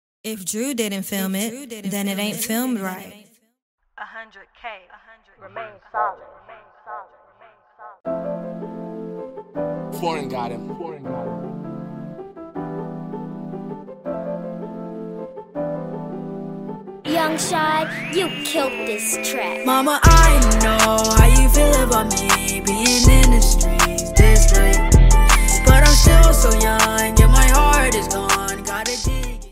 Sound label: slime emotion ringtone